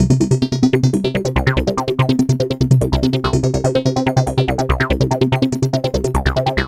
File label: Bass Loop